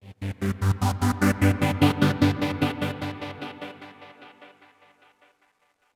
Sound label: Oneshot Synth